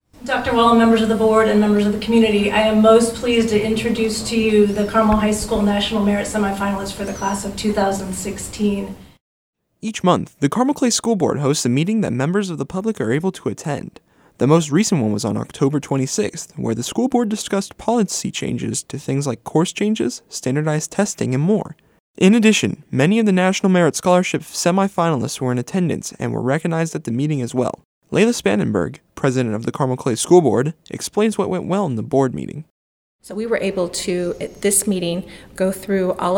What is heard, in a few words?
beat